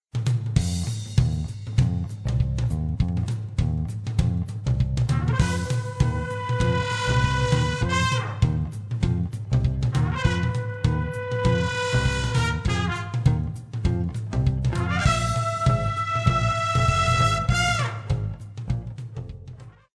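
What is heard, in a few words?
swing big band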